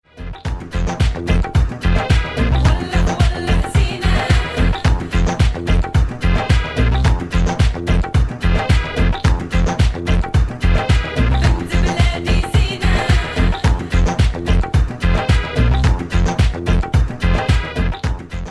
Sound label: beat arabic ringtone; bollywood ringtones